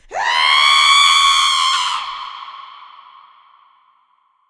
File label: scream attack; siren